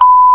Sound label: Bleep